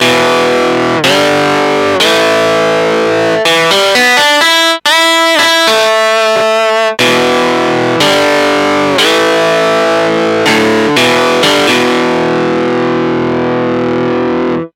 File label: E Guitar EHX Soul Food